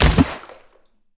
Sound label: Cannon explosion; Hero Karkinos